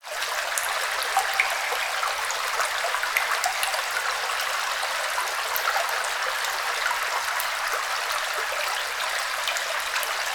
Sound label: water sewer flowing med light with drips